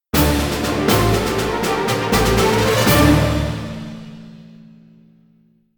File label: Battle Victory; ravenmark scourge of estellion windows gamerip 2015